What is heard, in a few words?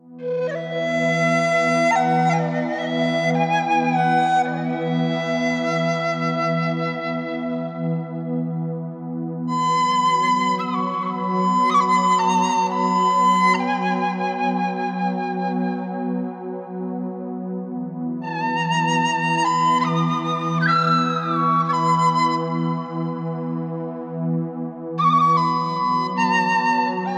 Seaside Meditation, Nature Sounds With Music